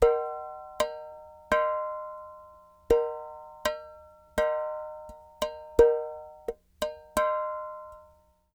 clay pot, world percussion

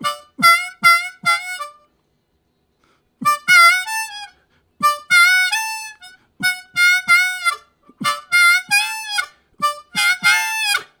High Push, Harmonica; Zero G

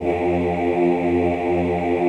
OOH F1, Classical Choir; Best Service; Extended Classical Choir

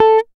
GTR TRIK, Jazzistic; Univers Sons

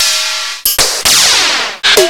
OBERHEIM KIT; OLD SYNTHS; Rubber Chicken Software Sample Bank

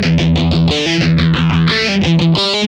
GUI 90 LICKS

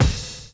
DRUM CHOP; AMG Gota Yashiki Groove Activator

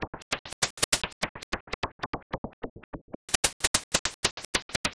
loop, techno atmos

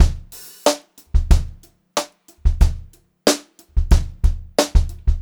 BEAT, Brushed Drum Loops; USB Soundscan vol.39; AKAI

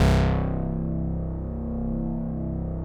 MW PULS PA

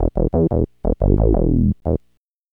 Synth Bass

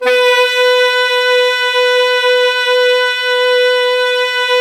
saxophone alto, saxophones; Roland - LA Composer vol. 1

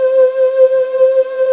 SYN K-2000, Roland

